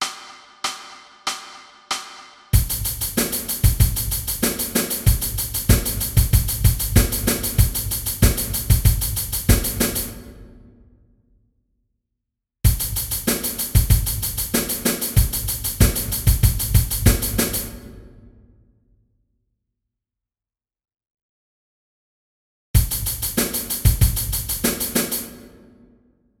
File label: Drum loops 95bpm; Drum track at 95 bpm